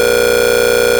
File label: warble; daphne